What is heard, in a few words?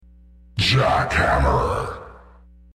Jackhammer